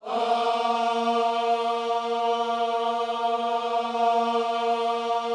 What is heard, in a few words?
OHS A#3, Gospel; Spectrasonics; Vocal Planet Vol.1 Gospel; akai